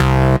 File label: Synths C; Vengeance Samples